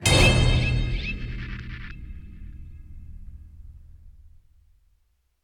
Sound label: Stinger